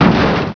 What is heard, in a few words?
ko dirt; World Racing 2